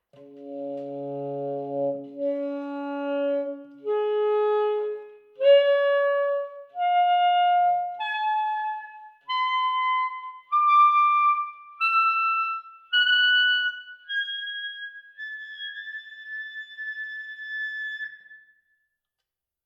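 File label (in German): alt, Flageoletts, Obertonreihe vom tiefsten Ton b (a bei Bariton) nach oben gespielt; Obertonspiel mit wechselnden Grundtönen. Alt; saxophon; spieltechniken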